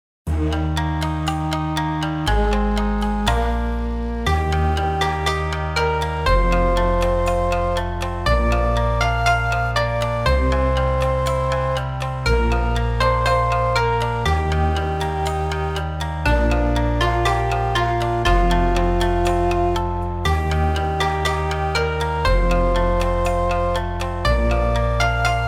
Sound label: arpeggio